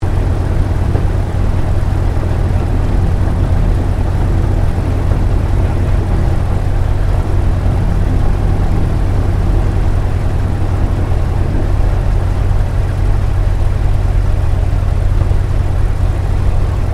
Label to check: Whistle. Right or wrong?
Wrong